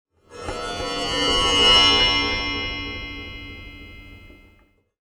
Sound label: Bowed & Delayed, contact mic, Wire & Tin; musicradar; contact mic madness